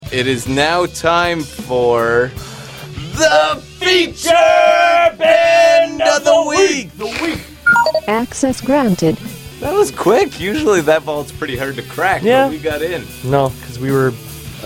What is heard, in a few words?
Green Tree Frogs; Feature Band